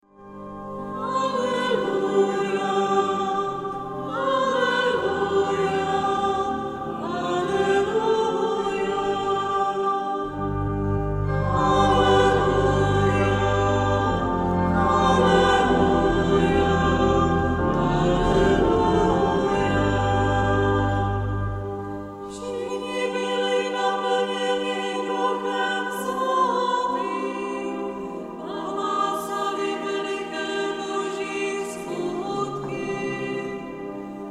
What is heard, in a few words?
aleluia mixolydic